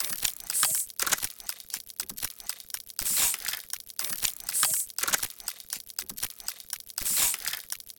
complex mechanical loops gears movement 120bpm steampunk tick; Sonniss GDC